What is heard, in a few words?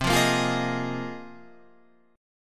A sharp 7th Suspended 2nd Suspended 4th